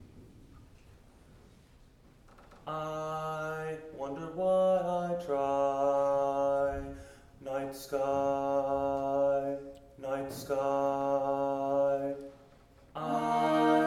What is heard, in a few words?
String Noise